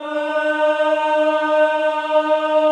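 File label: Choir ES3, Ehh; Eeh + Ehh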